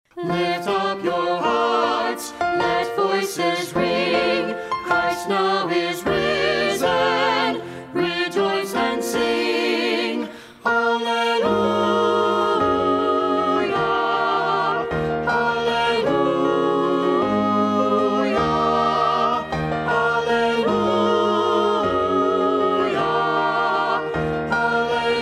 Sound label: piano; easter